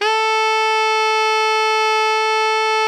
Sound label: alto FF A 3, saxophones; Greytsounds; Philip Wolfe David Crozier's Saxophones